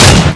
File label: cannon